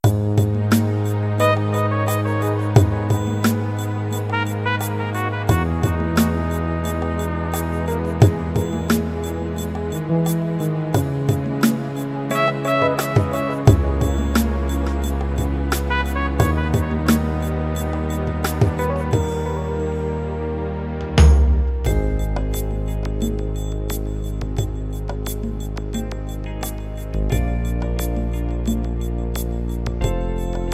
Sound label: Chill Out, rhythms; mzx500; preview pack 01